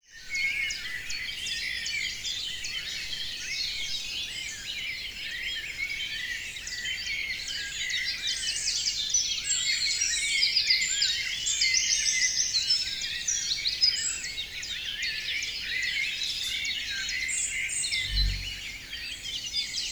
Vogelzwitschern

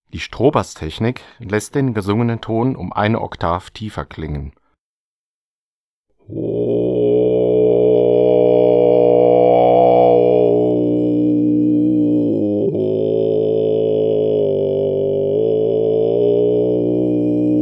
Strohbass Beispiel, Oberton Singen; Buch Oberton Singen CD

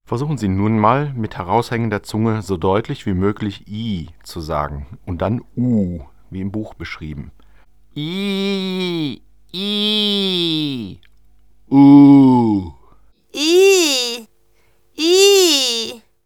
Ekel I und MUHKUH U dazwischen lauter; Buch Oberton Singen CD

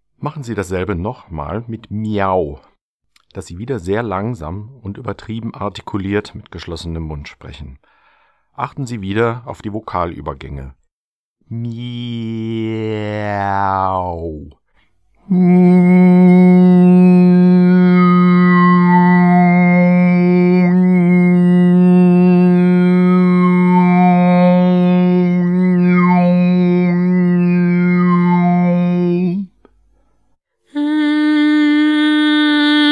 MIAU mit geschlossenem Mund; Buch Oberton Singen CD

